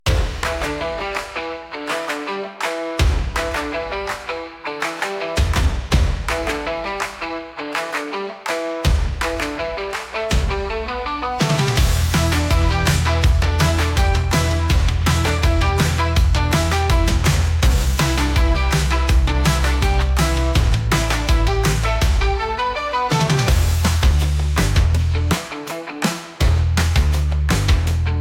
Stomp rock, free beats

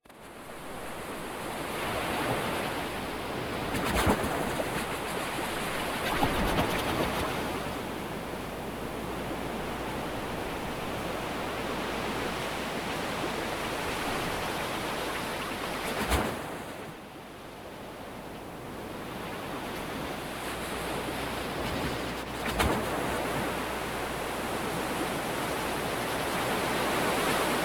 Binaural

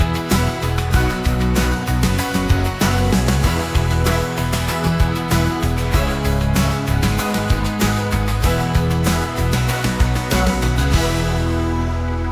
Logo Pop

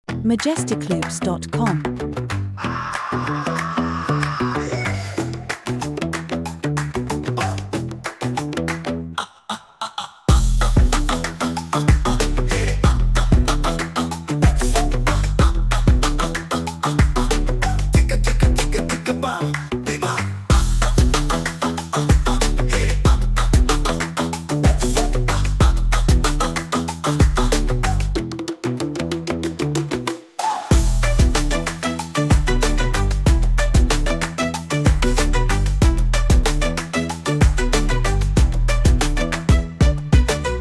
Funky, free beats

